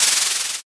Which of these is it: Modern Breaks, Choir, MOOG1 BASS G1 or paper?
paper